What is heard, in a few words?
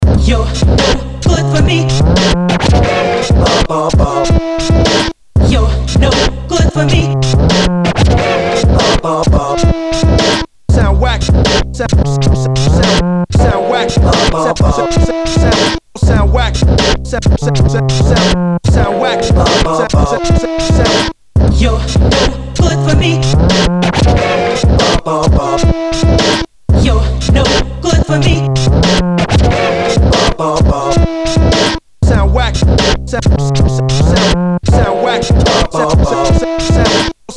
raw cuttin